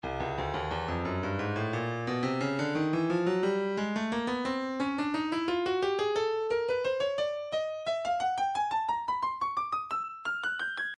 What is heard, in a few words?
breakbeat 088 bpm; barrio c; Stylus Breakbeat Midi Files